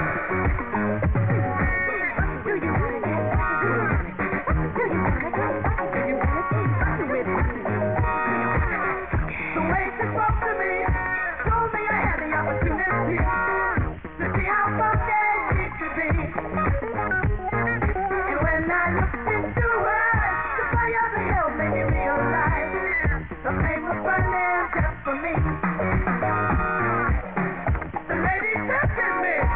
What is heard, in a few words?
funky